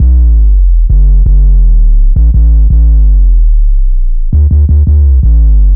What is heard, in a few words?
Hard Sweep Down, Bass Lines 166.5; Zero-G Jungle Warfare 1, 2 & 3; 90 s Sample CDs